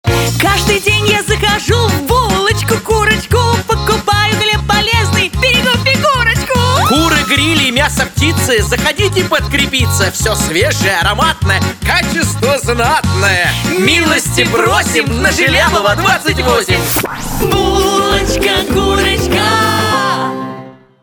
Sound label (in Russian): ЧАСТУШКИ ДЖИНГЛ; БУЛОЧКА-КУРОЧКА